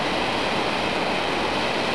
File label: Trailer